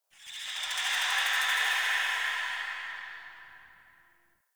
Bat Chatter; Ambience; Misc Outdoor; Warframe; Infested Eris

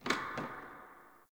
Bar Wooden Chair Move; Warframe; Nineteen Ninety Nine; Hub; Hub Pub

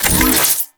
Briefcase Scythe Equip; Warframe; Weapons; Corpus

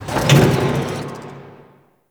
Clunky Machine; Ambience; Warframe; Corpus Railjack

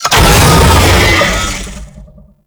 Vocal Pain, Infested; Warframe

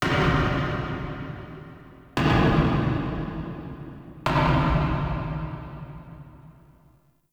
Knocks Small; Ambience; Warframe; Entrati

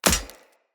Throwing Knives Impact Armour; Weapon Impacts; Combat

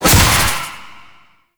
Corruption Pulse; Enemies; Abilities; Warframe; Zariman; Void Angel

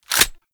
Pistol Reload Mag In; Warframe; Tenno; Lasrian

